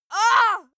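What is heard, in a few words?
female pain, screams; schreie; menschen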